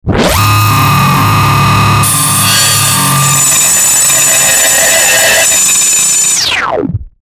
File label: Distortion High Frequency Ascend Descend; Blastwave